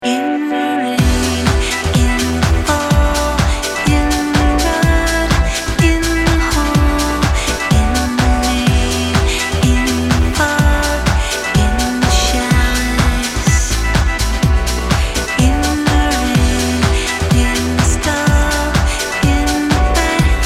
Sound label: Bell Towers